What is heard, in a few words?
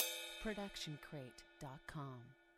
ride cymbal 20 inch bell; soundscrate